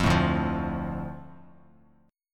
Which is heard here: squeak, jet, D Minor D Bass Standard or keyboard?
D Minor D Bass Standard